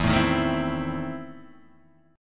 E Major 7th Suspended 4th Sharp 5th, Guitar, Drop D